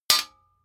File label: sword metal hit ring